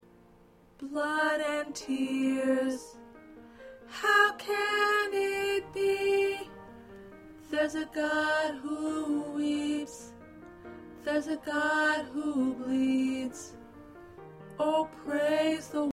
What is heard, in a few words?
Alto